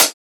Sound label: Closed Hat; FLPRO